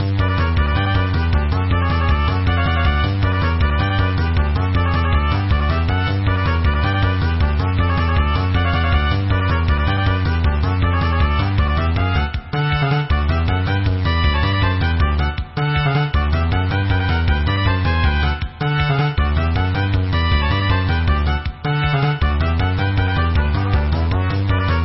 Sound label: sell machine